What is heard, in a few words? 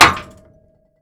Submarine Door Hit